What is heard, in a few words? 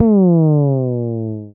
slides, bass; Spectrasonics; Bass Legends CD 1